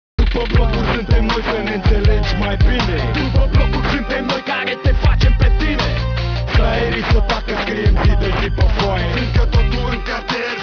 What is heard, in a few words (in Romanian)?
muzica; cstrike